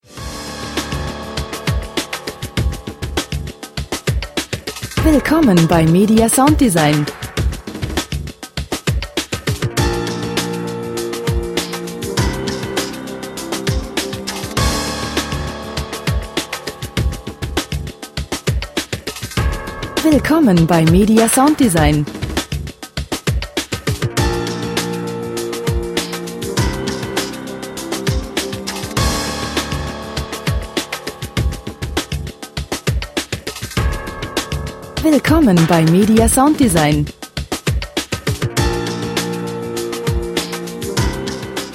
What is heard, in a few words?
percussion